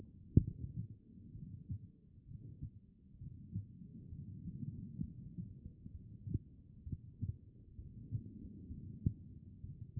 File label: HEART; B MODE